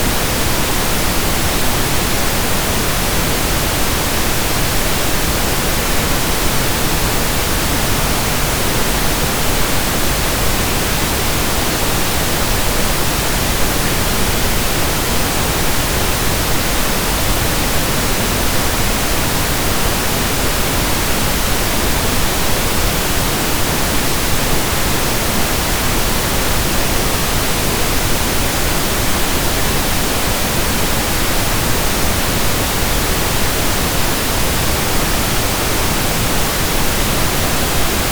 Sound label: Pink Noise